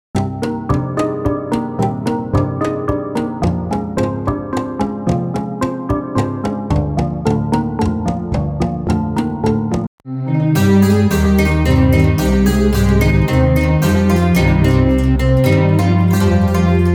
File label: Yangtze Plucked Strings Presets